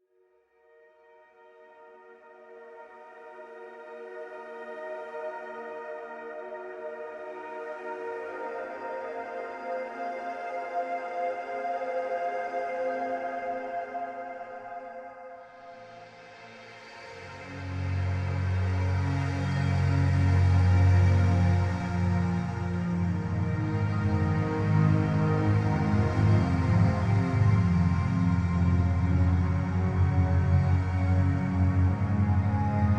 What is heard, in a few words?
New Player Cue; Doyen Hut